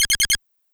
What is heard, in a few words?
alarm loop